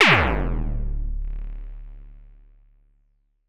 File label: Superkick Fx